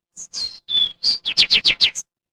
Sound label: animal bird